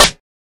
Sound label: old school short reverb acoustic snare sound c sharp key